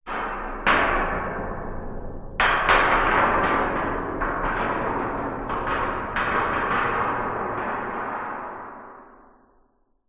foley metal large heavy movement resonates reverberant; zapsplat